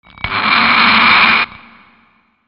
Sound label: FRICTION